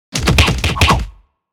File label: Flying combat anime fight